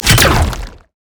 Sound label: Hand Bomber Proj Fire; Warframe; Man In The Wall